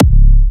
Kicks, Big Room Kicks, C#4

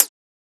Closed Hat

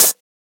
Open Hat; FLPRO

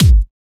Kick, Kicks; FLPRO

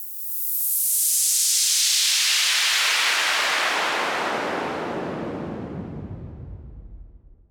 Fall Clean, Falls

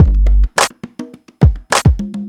Loop

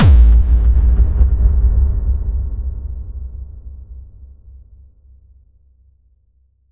Drop Really Hard, Sub Drops & Boom

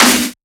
SnareClap; FLPRO

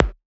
Percussion; FLPRO

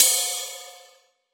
Ride; FLPRO

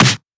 Snare; FLPRO

